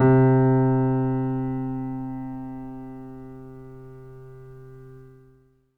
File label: R, Acoustic Piano, PIANO STEREO; InVision Interactive; Miroslav Vitous